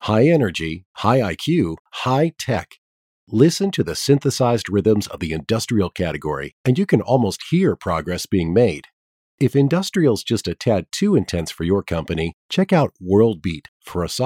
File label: Industrial, music on hold